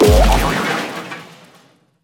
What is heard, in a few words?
Tank Explosion